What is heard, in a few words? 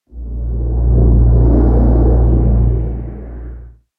cave, ambient; Minecraft